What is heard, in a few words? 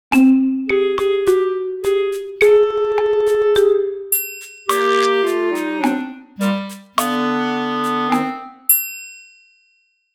comical